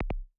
click analogue

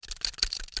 deagle special look at; weapons; cstrike; csgo

